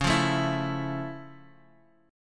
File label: F Augmented Major 7th; 9,8,7,9,x,x